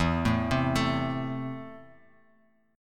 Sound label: E Suspended 4th Sharp 5th, Bass Standard; arpeggiated; JGuitar